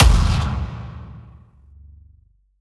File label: HITS, Cinematic Sound Effects